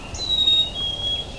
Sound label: chickadee; natureNavigator